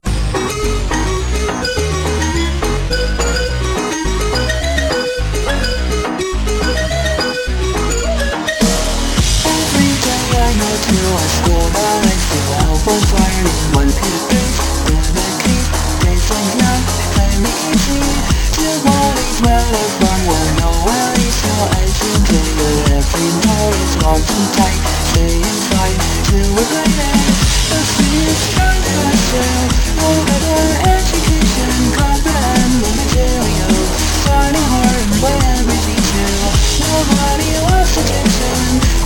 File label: hard techno remix